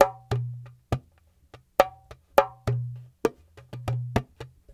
Dumbek

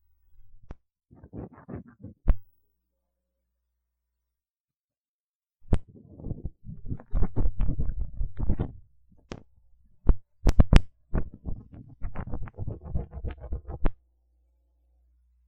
magnet

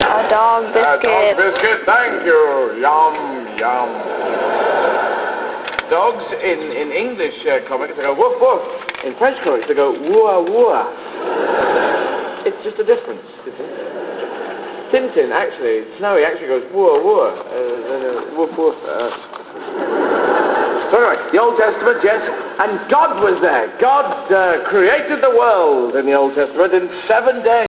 wooah

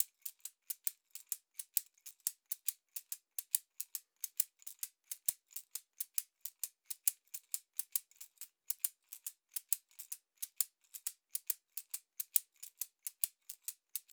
Dogbone shaker; Warrior (Tohoqashaw)

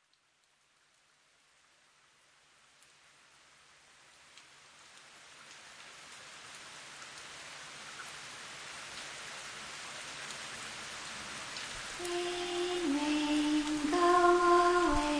rain rain rain

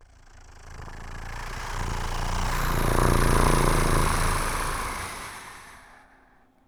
helicopter

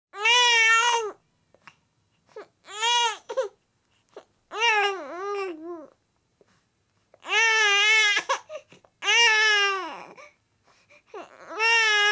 baby whining short; Asian Show Sound Effects

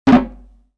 electronic bonk; music electronic; Asian Show Sound Effects

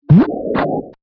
sploosh

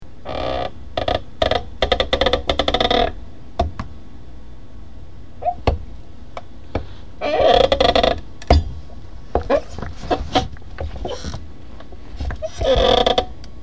chair

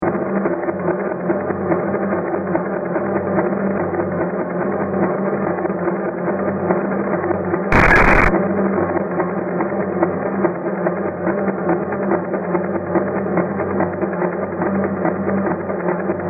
polyrhythm

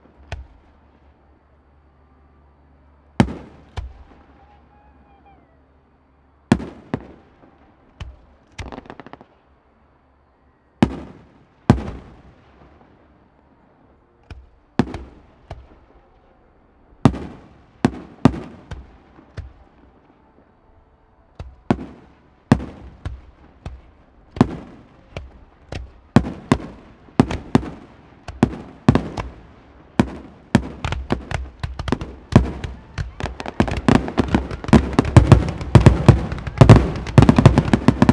fireworks; Ambisonics

